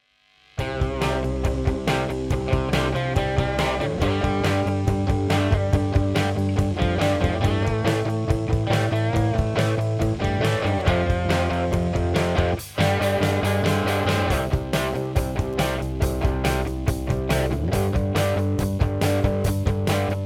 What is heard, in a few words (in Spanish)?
Pista para cantar las tablas de multiplicar